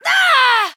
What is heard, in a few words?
Damage Fly; SmashWiiU; KamuiF